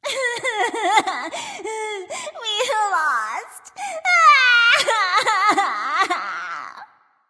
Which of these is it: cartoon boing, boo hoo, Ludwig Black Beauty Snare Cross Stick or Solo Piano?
boo hoo